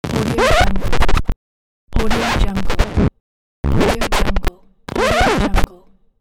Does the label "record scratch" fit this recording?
Yes